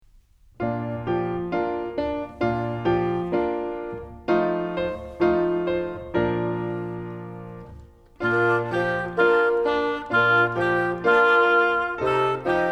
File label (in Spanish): musica solo